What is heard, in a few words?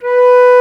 Flute mf; Multisamples; Digital Sound Factory Virtuoso